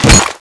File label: chainsaw slash, weapons